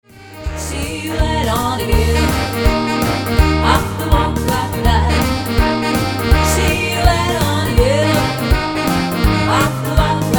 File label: Sax. solo